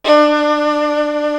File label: SOLO VIOLIN; East West; Global Sounds